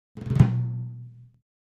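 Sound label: Timpani Hands Short, mallets timpani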